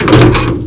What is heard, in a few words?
DOOR IRON; MOVEMENT